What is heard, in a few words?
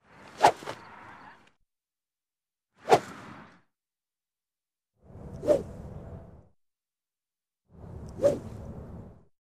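golf practice swing of club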